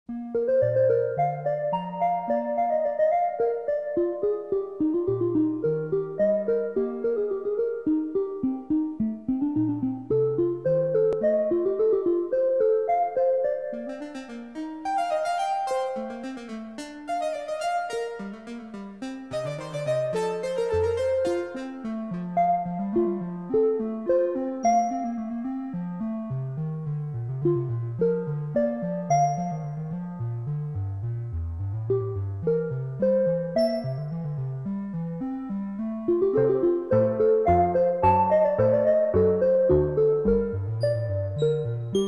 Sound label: Two-Part Invention in B-Flat Major